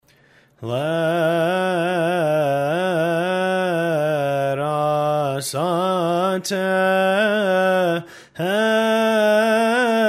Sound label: let us attend gregorian